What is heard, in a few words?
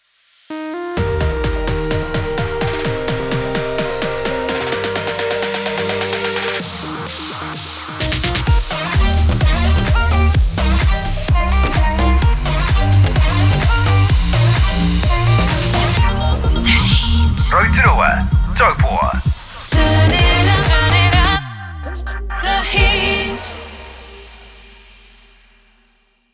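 Sung Jingle Eb Major 128bpm Ramp; The Heat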